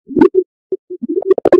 bees sounds like water

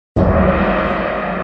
hey kid ahhhh gong

